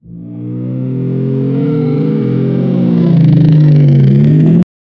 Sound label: a wheel spin like sound; solid